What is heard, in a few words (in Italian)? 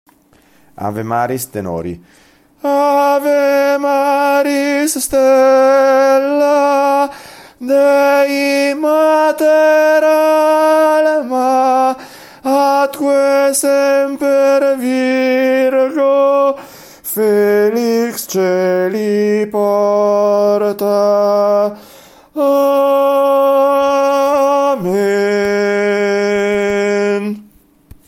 tenori; Ave Maris Stella